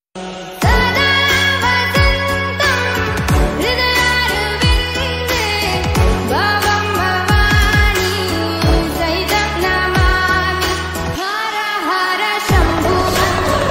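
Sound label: mahadev ringtone song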